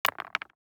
DICE on Neoprene, Throw and Roll, Mini, 2 Two Dice; Samples; ArticulatedSounds; DICE Sfx Party (Extended)